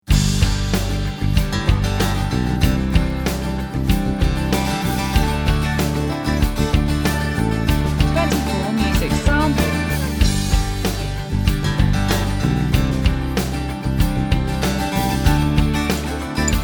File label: happy rock